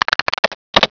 type

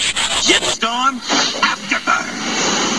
trans down; openffa

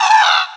widow pain; rogue

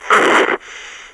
fall; mercy

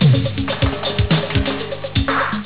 conga

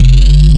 ps fire, plasma shield; zaero; items

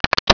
keypad; baseq2

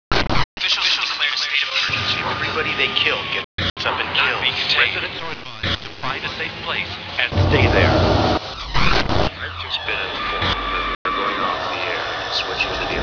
emergency; spawngirlsounds

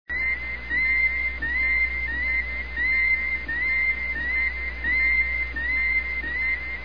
sick bay

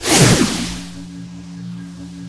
holy bolt, spells

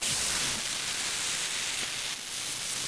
steam

